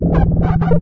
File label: dog phrase; source engine; episodic